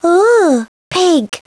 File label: pig